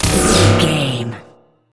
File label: sci-fi weapon blaster laser deep drone